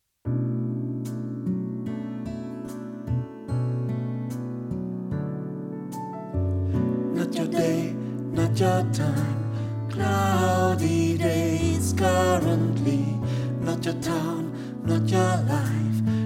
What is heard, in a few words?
Bass